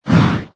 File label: attack